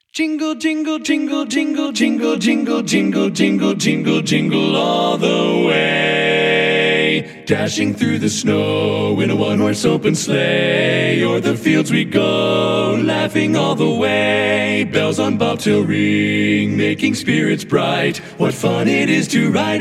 Jingle Bells All Parts

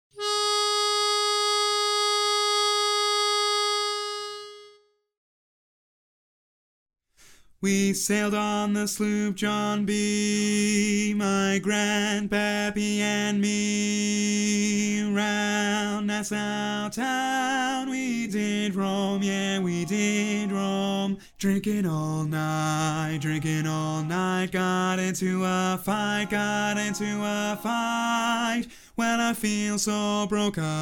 Bari predom

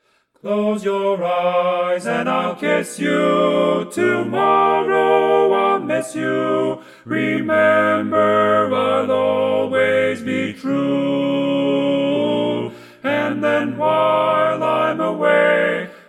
Tenor right

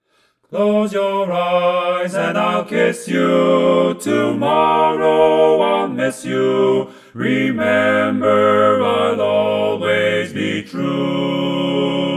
Full harmony

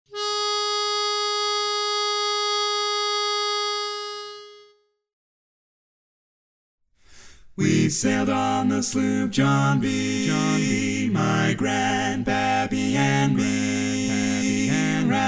Sloop John B Tenor missing

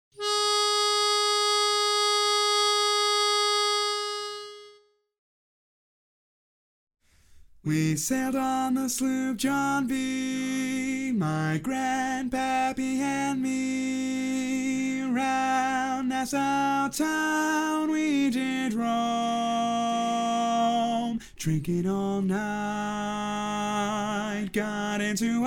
Lead predom

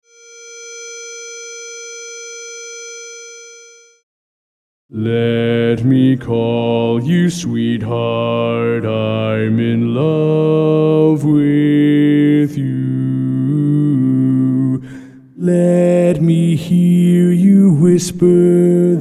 Bass

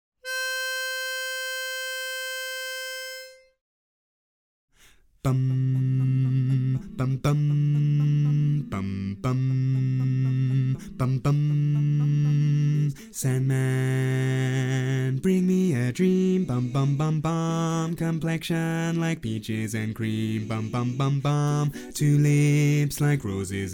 Bass predom.

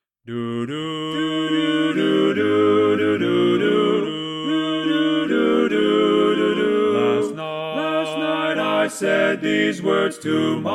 Baritone left

